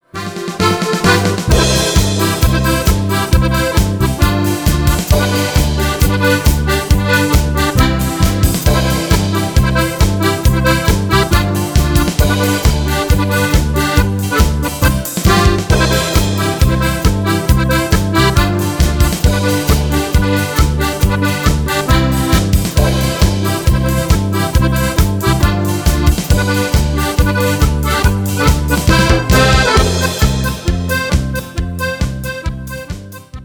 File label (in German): trompeten echo